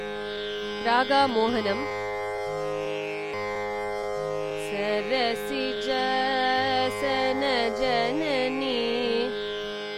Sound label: Mohanam Phrase